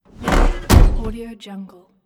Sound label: truck door open close